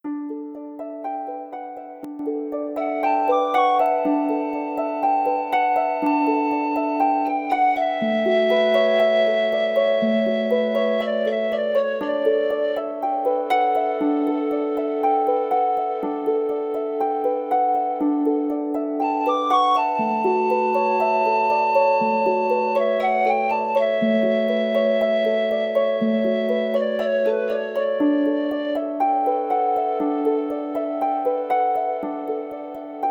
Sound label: wonder song flute; theodyssey